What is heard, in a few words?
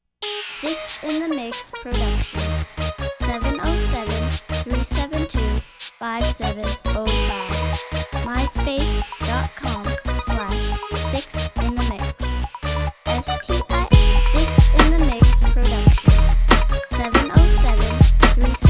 bounce